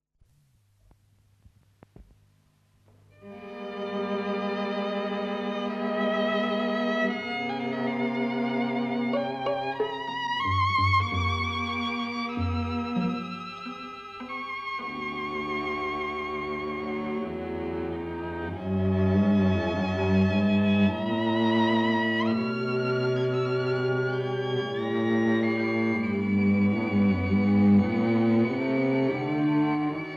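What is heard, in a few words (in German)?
streichquartett; op9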